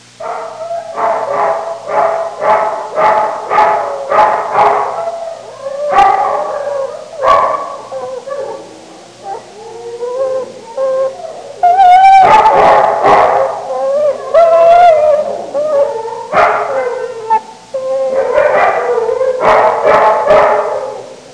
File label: Various dog sounds vintage